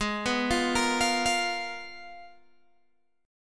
Ab Minor 6th Add 9th, Ab, Guitar, Open G

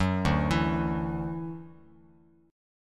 D-Major Add 9th D down Bass Standard; arpeggiated; JGuitar

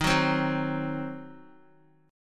D sharp Minor 6th D sharp down Guitar; strummed; JGuitar

